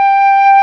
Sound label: BLOCKFL, ethnic; Rubber Chicken Software Sample Bank